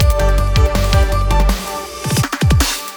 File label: Snow Transition; sky rogue